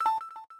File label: button; pkmn